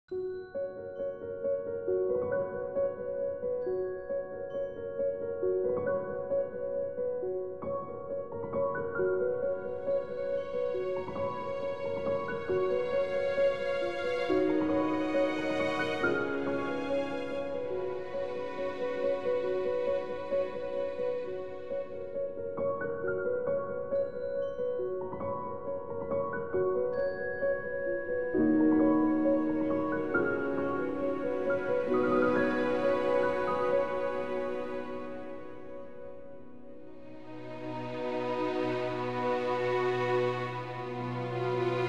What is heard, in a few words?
Bright Family Hopeful Piano Melody